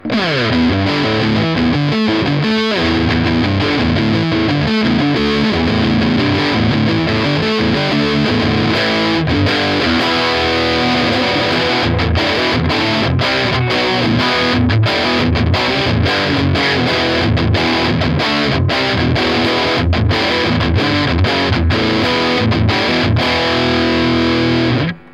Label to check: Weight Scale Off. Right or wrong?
Wrong